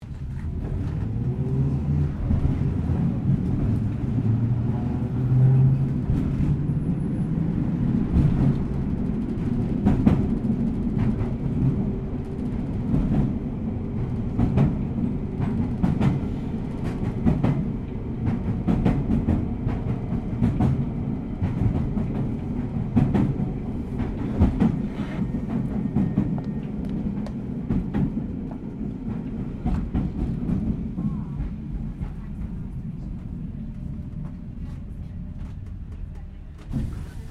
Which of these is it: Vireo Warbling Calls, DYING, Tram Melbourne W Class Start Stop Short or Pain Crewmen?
Tram Melbourne W Class Start Stop Short